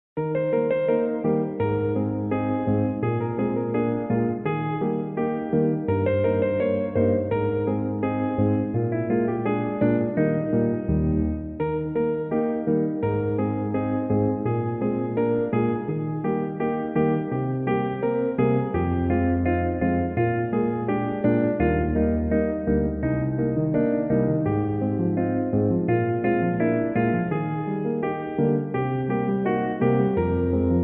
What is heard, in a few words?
karaoke